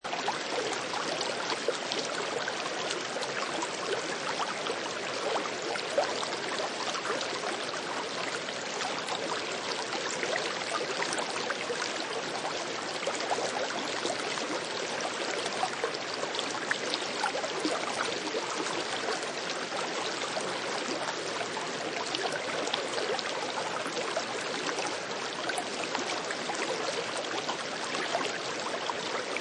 Gentle River Loop, Water splashing and trickling gently down a small river; Good stereo definition, approx. 6 ft from water; Forest; Lynne Music